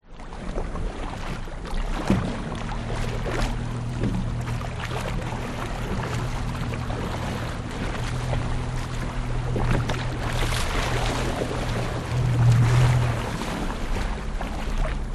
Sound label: transport boat drive sea slight choppy slowly stop start outboard motor 16 ft; fiberglass